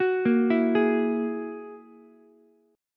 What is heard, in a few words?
G sharp 7th Suspended 2nd Sharp 5th, Ukulele; G#7sus2#5; arpeggiated; 11,10,12,11; JGuitar